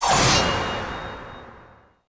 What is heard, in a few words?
effect sword; feheroes